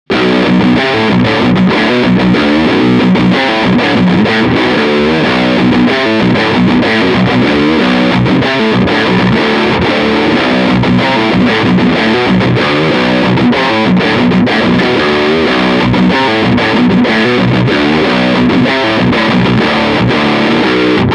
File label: Super Crush 100 Modern Metal